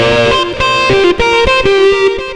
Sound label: xtra lead